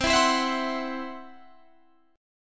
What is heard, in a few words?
C Major Flat 5th, C, down, Guitar, Standard; strummed